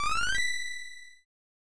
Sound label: Weapon Level Up!; Secret of Mana; snes